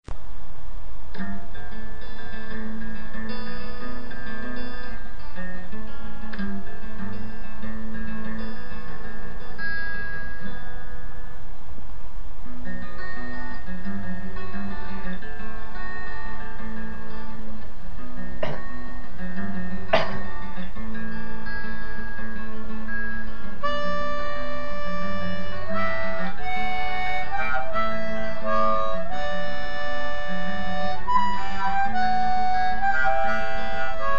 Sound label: harp